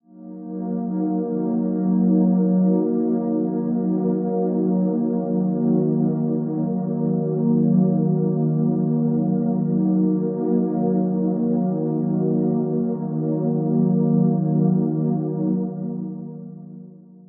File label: TEXTURE - 125 BPM D# Min, Ear Candy; Sunrise; Cymatics; ANALOG EVOLUTION Beta